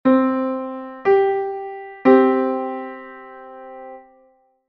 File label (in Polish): kwinta c1 g1; konsonans i dysonans